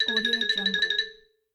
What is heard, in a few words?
the xylophone trill